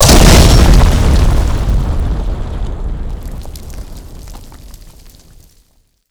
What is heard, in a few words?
explosion stone close